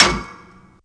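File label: crowbar hit; cstrike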